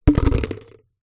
household small plastic halloween tub down on wooden floor